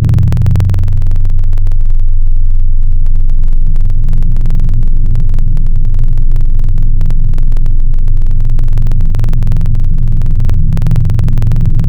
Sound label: Kalimba; Synthetic's Serum Collection; XLNT-Skrilla Tables